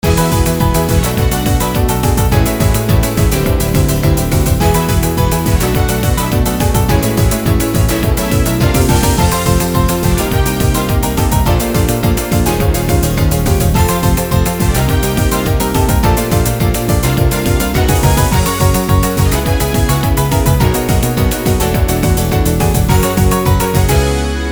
continue; Sonic 3D Blast Original Soundtrack

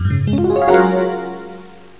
get coin; Sonic1

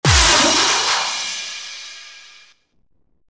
Carnival BGM; SEGA; Sonic Riders

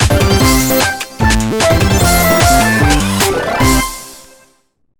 Carnival Music; SEGA; Sonic Riders

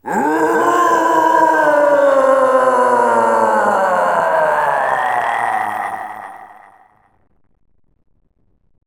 Guardian Roar (Japanese); Sonic Riders

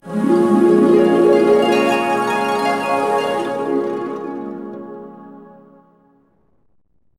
Angelic Sound; Sonic Riders

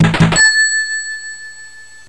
Complete

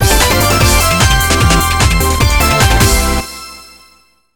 Carnival Music; SEGA; Sonic Riders

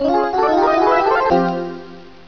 allcoin; Sonic R More; PC

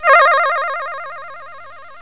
Bonus

